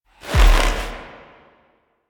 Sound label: Drifter Pull; Warframe; NineteenNinetyNine; Stage12PartyOfALifetime